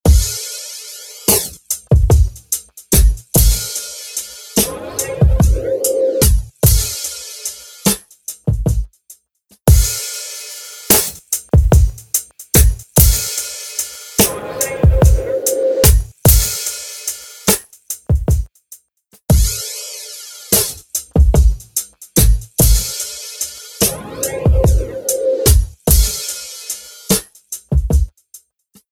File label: instant flanger mk ii